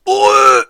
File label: oeuuh